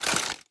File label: reload mvt b, weapons; operator